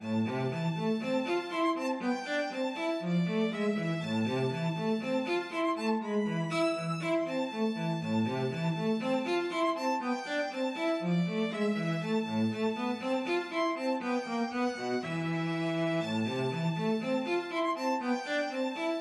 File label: violin cello duet